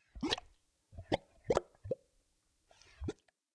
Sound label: swamp, lake